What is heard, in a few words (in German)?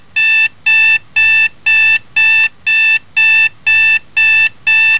WECKER